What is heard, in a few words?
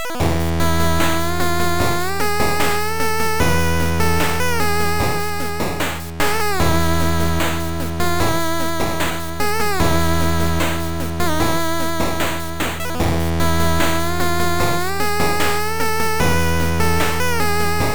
8bit In India, レトロゲーム風のインドっぽい曲です。; 【8bit フリーBGM】インドの民族音楽っぽい曲; エスニックな雰囲気の町などに合うと思います。